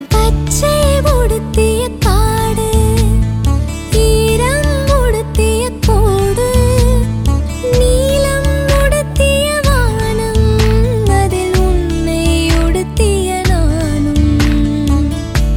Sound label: Female